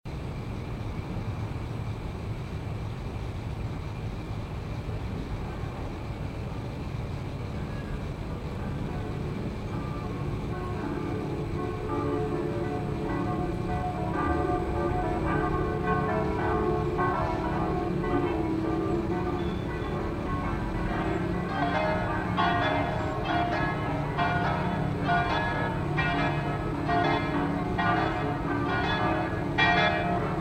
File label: padova bells, halftime